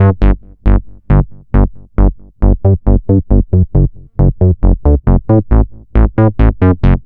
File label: Synth Bass